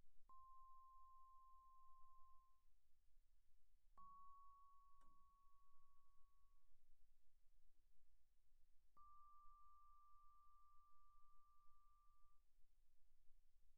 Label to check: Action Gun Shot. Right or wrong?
Wrong